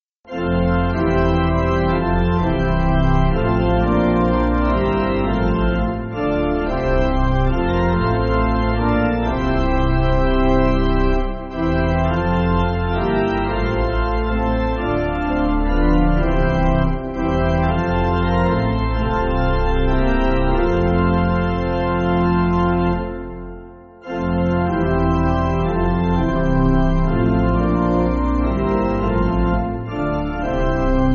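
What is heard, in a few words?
Pipe, Pipe Organ, Backing Files for Churches; To Mercy Pity; Epsom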